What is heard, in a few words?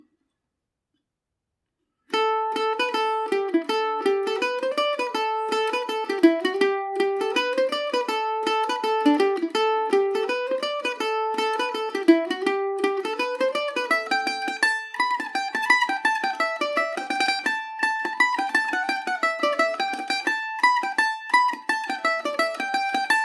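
A dorian paris swing, irish mandolin; Paris Swing Macaferri mandolin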